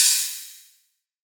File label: Ride; Hats and Cymbals